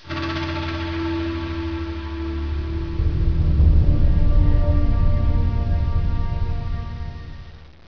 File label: intro map